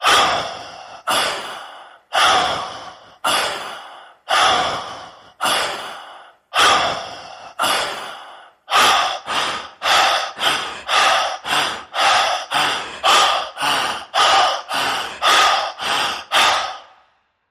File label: Inhale Exhale Male Breathing